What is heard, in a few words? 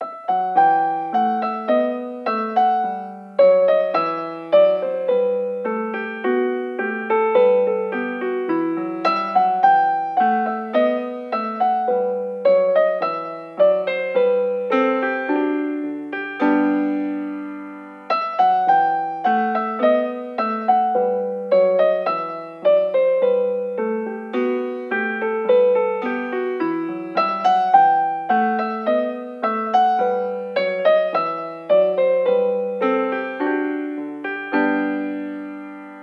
Bourree from Lute Suite in E minor piano; BWV996